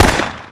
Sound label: sentry fire; buildable